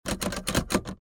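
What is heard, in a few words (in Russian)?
попытка открыть шкафчик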